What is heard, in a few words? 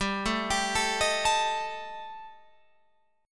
G Suspended 2nd Sharp 5th G down Guitar Open F, Gsus2#5 chord; arpeggiated; JGuitar